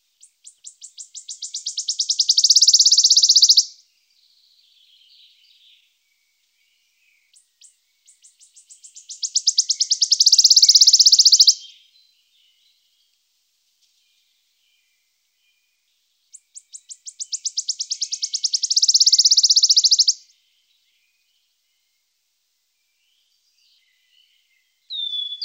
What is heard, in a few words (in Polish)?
Swistunka, Ptaki Glosy